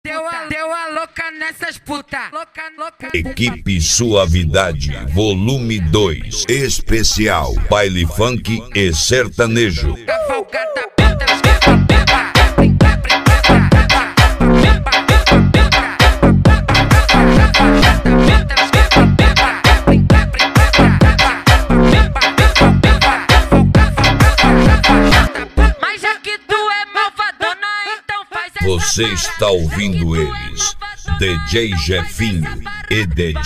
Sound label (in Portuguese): especial baile funk sertanejo